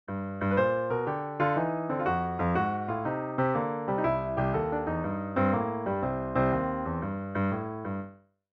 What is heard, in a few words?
blues